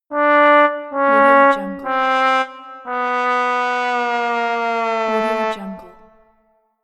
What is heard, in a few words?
sad trombone; soundjay